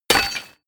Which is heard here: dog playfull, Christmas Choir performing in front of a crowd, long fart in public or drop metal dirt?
drop metal dirt